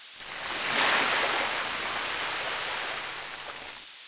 Wellen; PC Gamer IT CD 48; 1602 AD; 1602ad demo

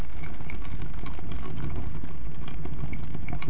feuer

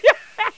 möve; Loewe1

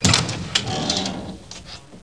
Terasse

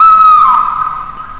KAUZ; Groovy Bytes: Behind the Moon

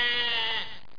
lamm

